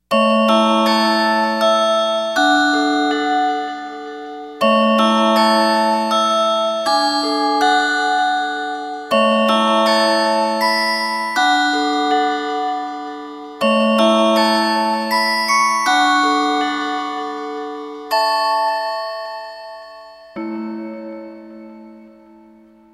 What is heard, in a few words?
chime; denon